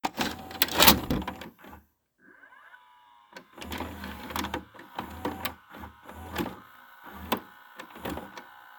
VCR Startup

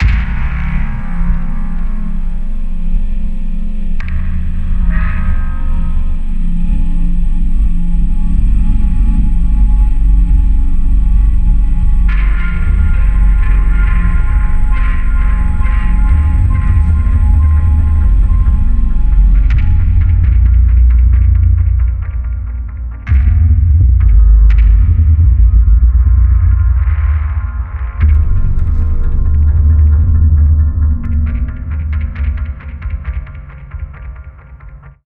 Bassy Ambient Sounds

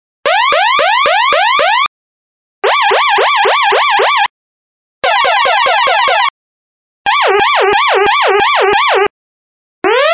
Siren Looped Bits

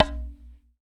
Marimba Note Struck

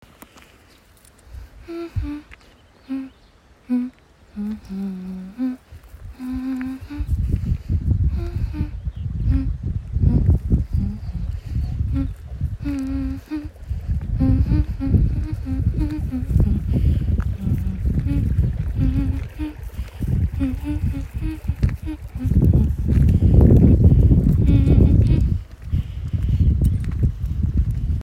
Girl Humming

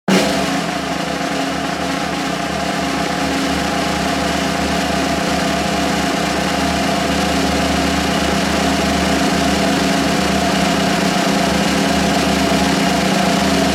Snare Drum Buzz Roll Then Tada

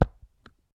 Hit Wood

